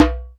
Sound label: DJMB, Korg EMX-1, Drum Machines